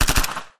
SUBMACHINE GUN BURST; bursts

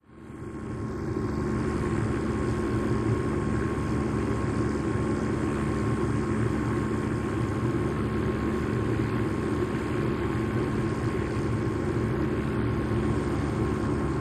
OH6 Loach Exterior, little bird

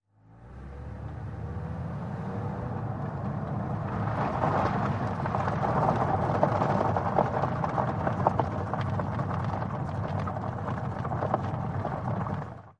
Sedan Pavmt Grvl Med

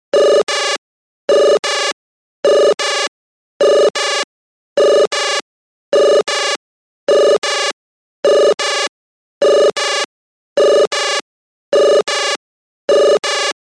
Blips Two Tone Two Ton, beeps tones

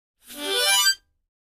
Mouth-organ, Glissade, Type 2 - Ascending; woodwinds

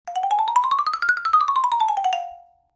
Xylophone Fst Up Down, mallets xylophone

